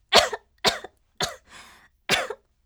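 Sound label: voice clearing throat; sekibarai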